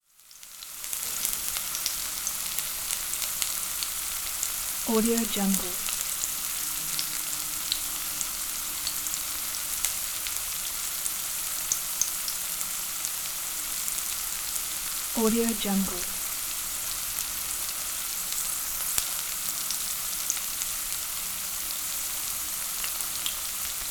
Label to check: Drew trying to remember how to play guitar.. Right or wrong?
Wrong